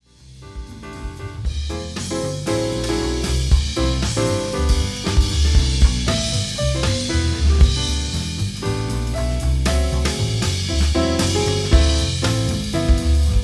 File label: jazz trio